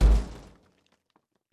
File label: Capsulte Impact medium